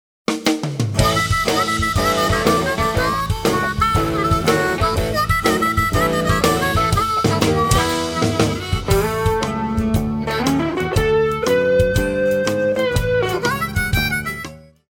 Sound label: blues